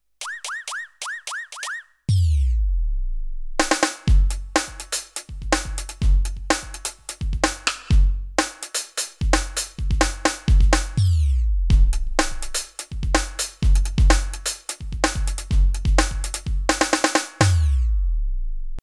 Octapad

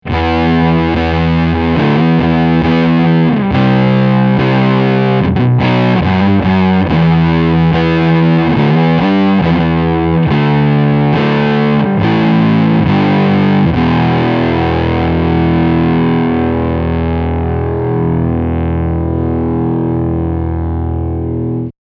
Fuzzy

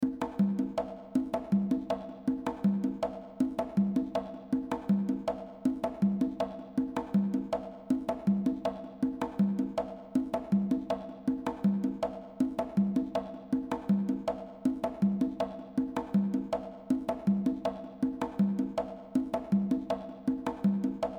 Reverb loop; DSP Quattro Reverb

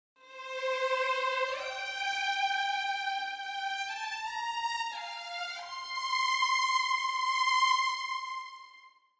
Agitato Violins; Mancini

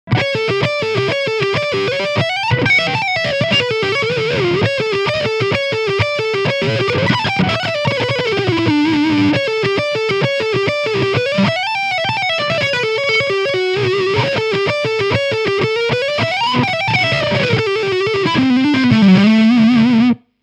Channel 4 Lead

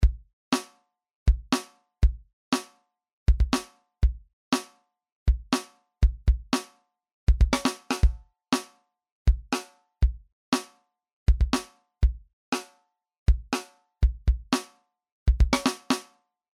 Drums Original

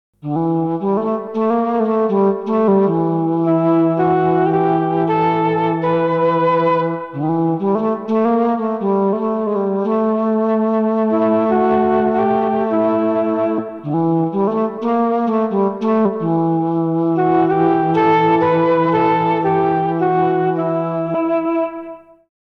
Boss GM-800 Flute

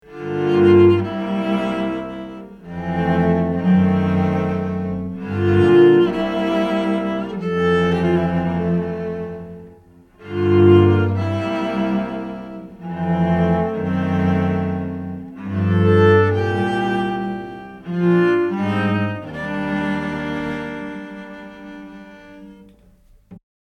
Tierra Audio Gravity VCA Strings dry

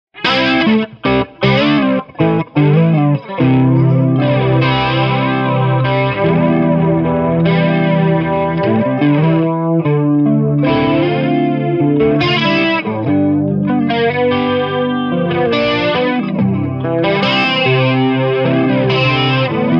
Classic Overdrive

